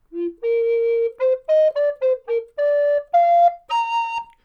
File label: Alto Recorder